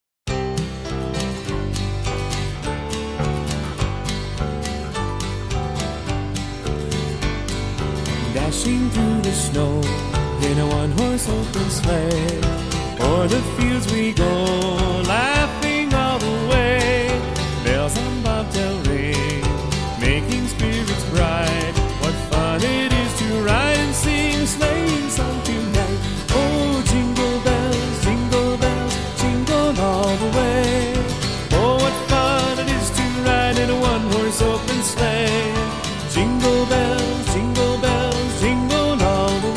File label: Jingle Bells